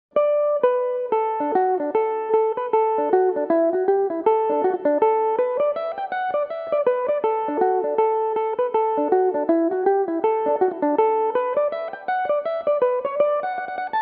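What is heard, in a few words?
last nights fun D major, learn some tunes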